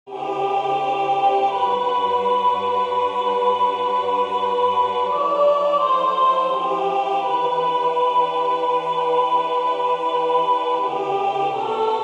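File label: The Seeker soprano, wisdomchant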